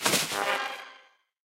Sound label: Casket Enter; Deathrest Casket